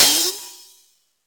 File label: open high hat sound g key